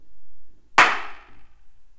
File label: flutter